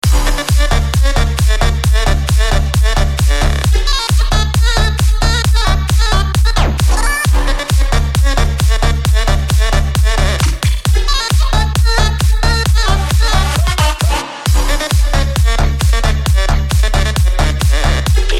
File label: Islamic Techno